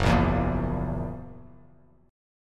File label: Gb 7th Gb down, chord; strummed; JGuitar